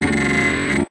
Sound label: Music Devourer Crack